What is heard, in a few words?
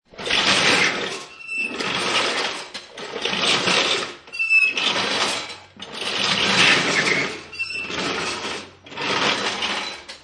Pneumatic Jack Drag, Pneumatic jack dragged and positioned under a car. Several metal squeaks, scr; Pneumatic jack dragged and positioned under a car. Several metal squeaks, scrapes, drags, clanks, rattles.